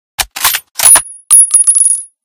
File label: unjam, weapons; Remington 700; r700; Remington 700 Reanimation; librarian r700